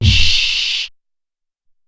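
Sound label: Vox Kick 3, Alesis SR-18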